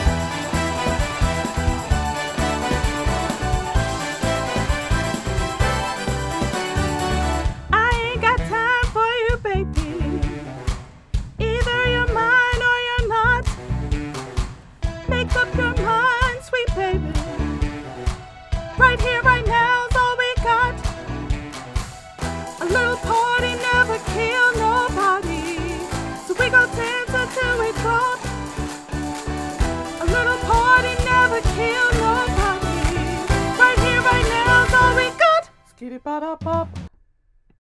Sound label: vocals audition music